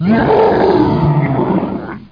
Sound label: monst